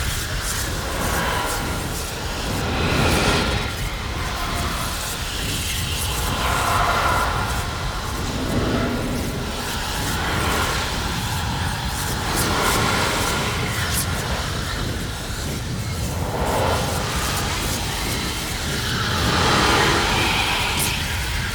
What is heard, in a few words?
Hub Orb Loop; Warframe; Infested Eris